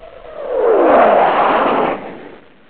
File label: Fly By, F/A-18 Hornet; F/A-18 Hornet 2.0 Demo